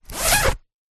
foley coat jacket zipper

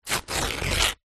kitchen towel paper rip; foley

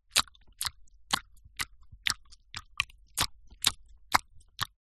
human eat chew chewing gum

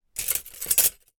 foley glass peices shards handling movement

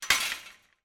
industrial metal gate close

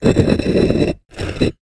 foley large rock movement; zapsplat

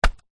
footstep single paving stone; foley

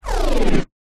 sound design rewind reversed vibration

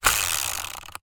leisure game spin wheel; game of life; zapsplat

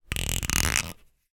foley bottle cork twist creak; zapsplat

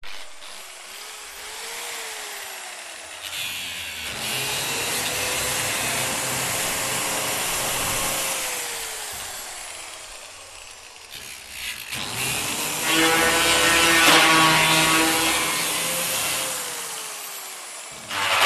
industrial angle grinder med bursts

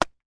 impact tree branch hit hard object

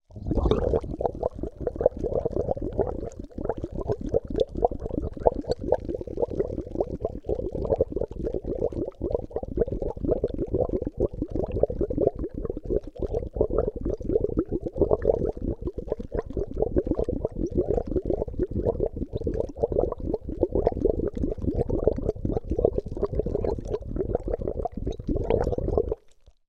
nature water gurgle long

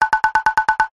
cartoon wood knocks fast x8

